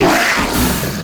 imp attack melee, monsters; Doom

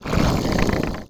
imp chatter, monsters; DoomRTX

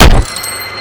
cgun shot; DoomRTX

